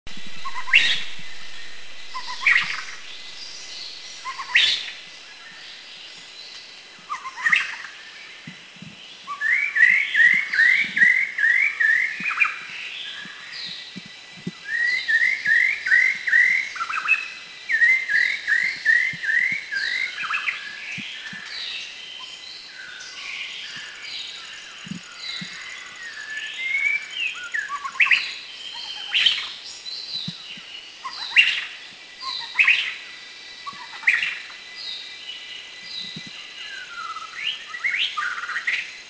White-rumped Shama; Copsychus malabaricus; Thailand; Krung Ching

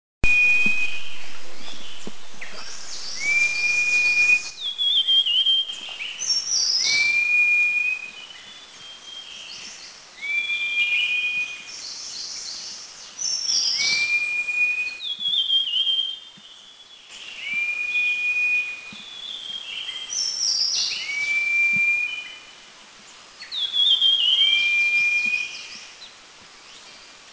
Purple Cochoa + Beautiful Sibia, Eaglenest, India; song

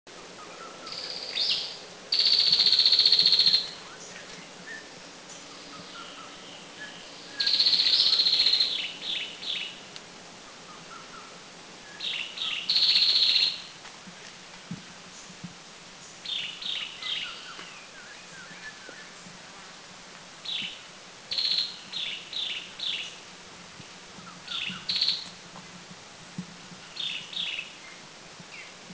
Dark-necked Tailorbird, Thailand; Kaeng Krachan

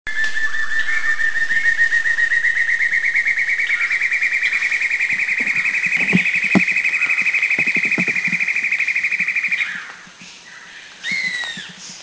Black-and-Yellow Broadbill; Thailand; Krung Ching

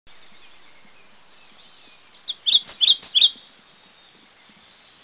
Whistlers Warbler; Dirang; India

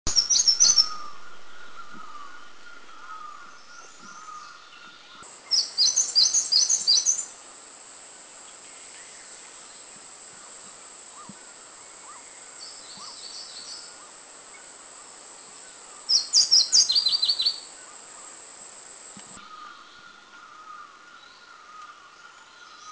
Grey-cheeked Warbler; Mishmi; India

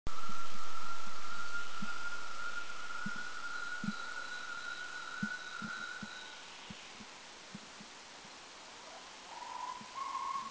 Taiwan Partridge

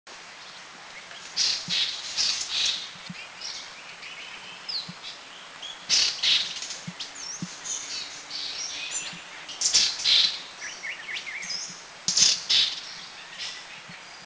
Striped Tit Babbler, Thailand; Kaeng Krachan National Park; Pin-striped Tit-Babbler

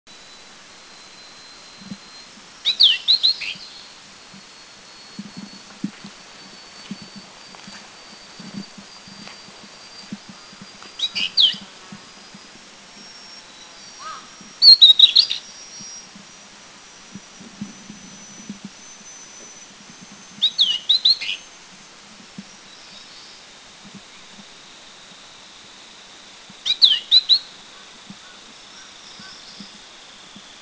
Collared Bush Robin Song; Collared Bush-Robin Tarsiger johnstoniae Country endemic; Taiwan; Anmashan; 10+ daily Anmashan

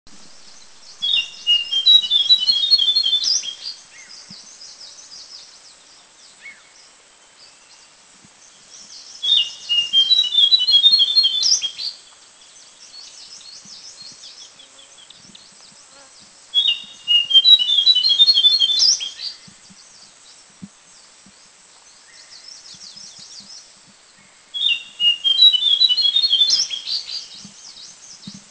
Rusty-bellied Shortwing; Mishmi; India

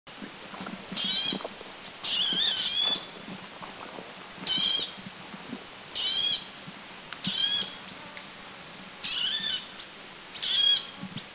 Chestnut-vented Nuthatch, Doi Lang, Thailand

